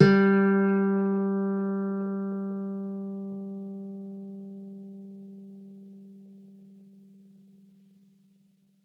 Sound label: Soft G3, Campfire Nylon guitar; Worra's Campfire Nylon